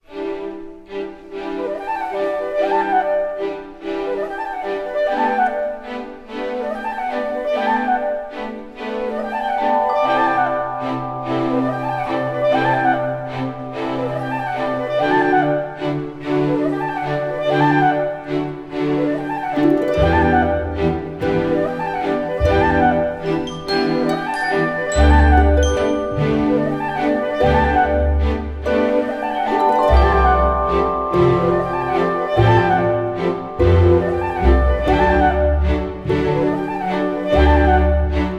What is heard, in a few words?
Alarm, ringtones; Lumia Ringtones